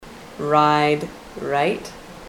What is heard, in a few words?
ride right